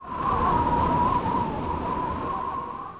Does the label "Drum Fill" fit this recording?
No